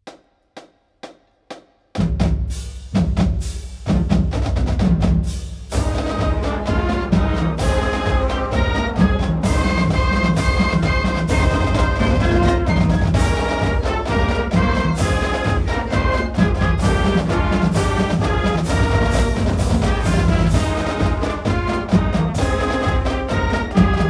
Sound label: single fight with roll off